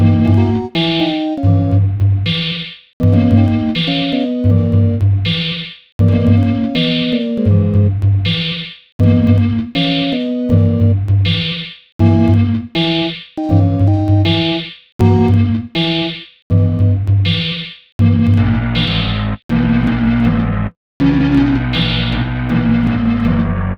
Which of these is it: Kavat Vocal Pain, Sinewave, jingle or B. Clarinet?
Sinewave